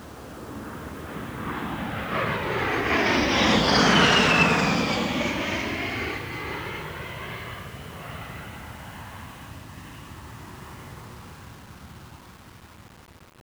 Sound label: A-10 Warthog pass